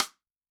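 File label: Snare Side Stick; Yamaha DTXpress-II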